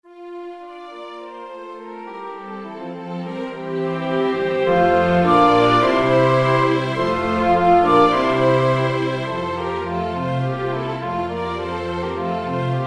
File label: chamber orchestra